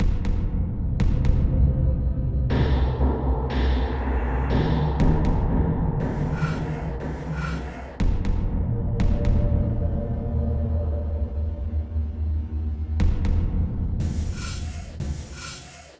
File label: Danger Tonal; Insanely Twisted Shadow Planet